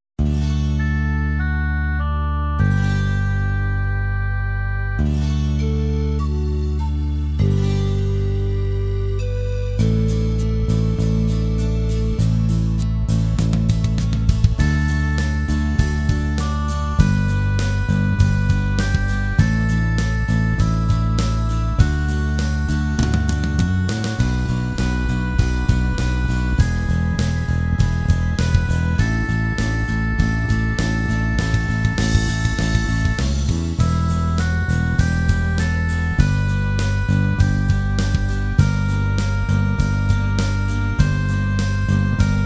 When we eat backing; Backing tracks

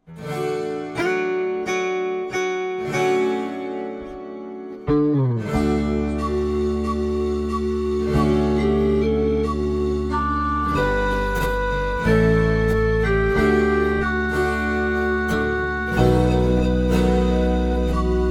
Have mercy on us O Lord backing; Backing tracks

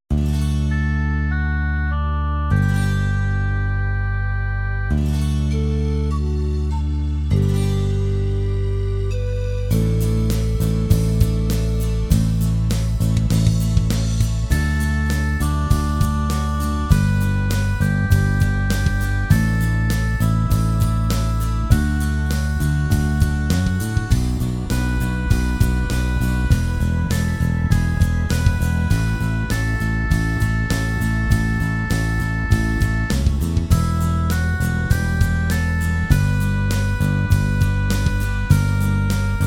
Save us Saviour backing; Backing tracks

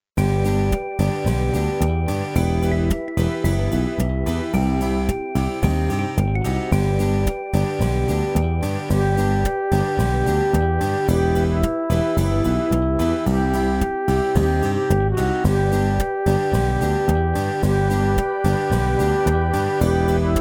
BACKING; Lord come to my aid

